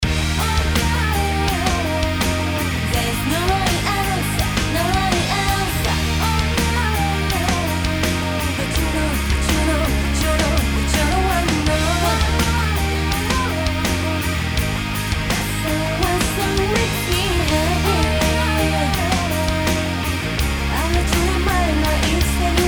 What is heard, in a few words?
tone on